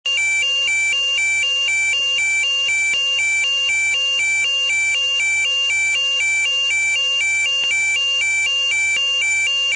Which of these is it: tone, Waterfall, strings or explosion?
tone